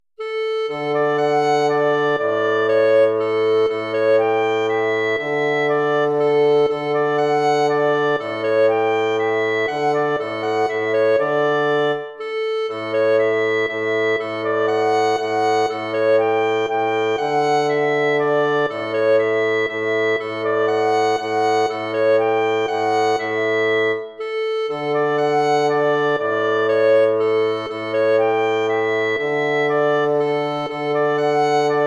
waltz; Carulli Ferdinando

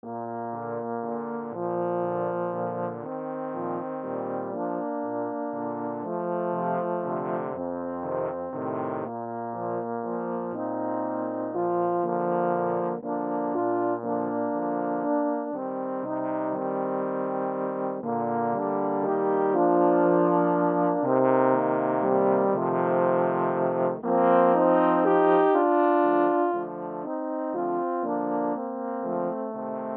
waltz for eight horns

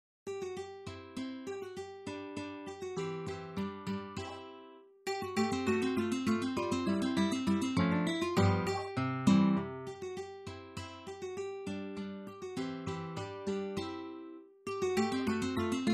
duo facile; Call, Leonhard von

